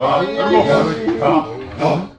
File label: african tradition, MALE SINGING